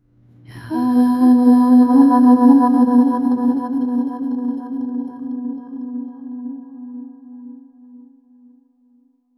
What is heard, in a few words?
deep female voice humming; approach